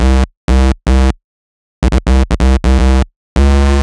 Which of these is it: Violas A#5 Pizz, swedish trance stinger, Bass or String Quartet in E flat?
Bass